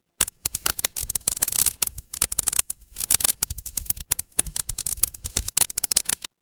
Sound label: fire electric crackle